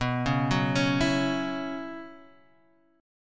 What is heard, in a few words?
B Suspended 2nd Suspended 4th, chord; arpeggiated